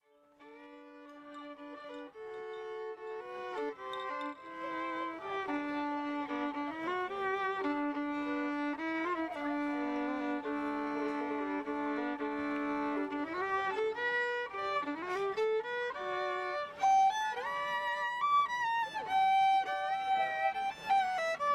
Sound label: 19th Century Period Music Violin